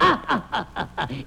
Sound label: laugh; popeye